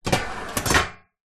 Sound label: household oven grill drawer slide out